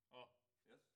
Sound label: Ride